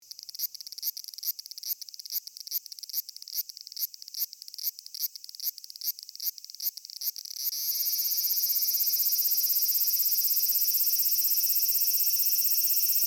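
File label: cicada abura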